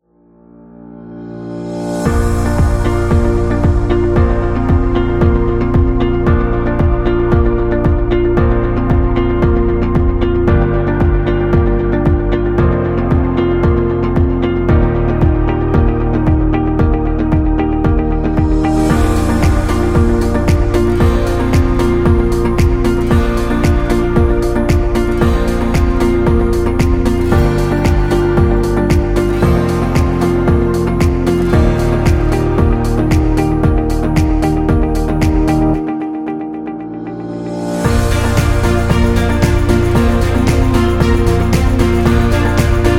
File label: Medical Background Music; motivational speech